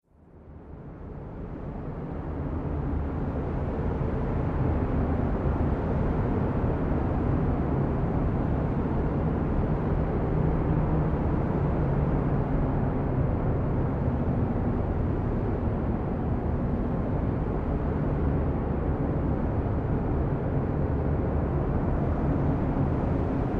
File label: Deep Tundra Winds; Environmental Sound Effects